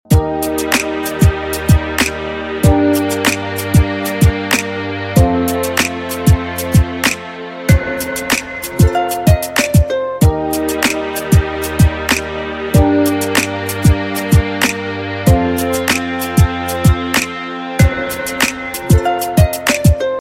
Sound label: cool ringtone